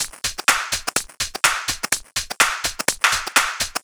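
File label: Swing No bass drum, Drum Loops 125bpm, Nu Dance Electro; Zero-G - Soundclash; Swing Injection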